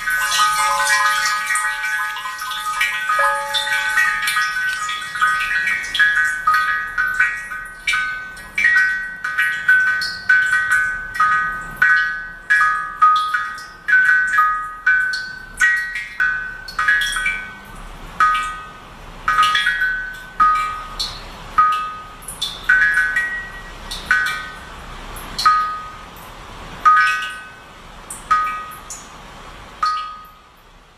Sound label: Suikinkutsu recording